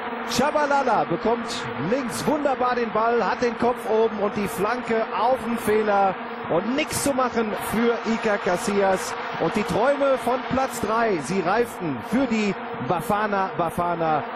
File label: vuvuzela